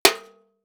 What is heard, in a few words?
Impact VCR Hit By Copper Pole